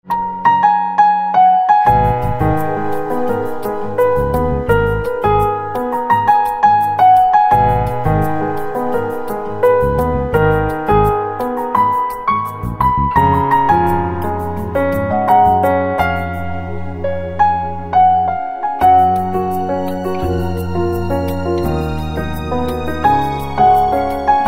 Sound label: Piano